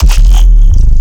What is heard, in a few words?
Glitchy bass growl